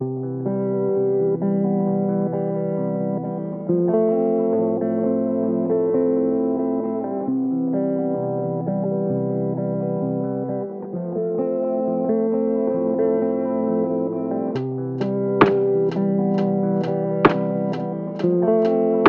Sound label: lofi hip hop